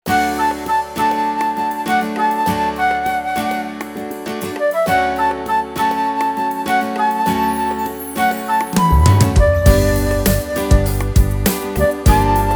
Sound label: Pop Gospel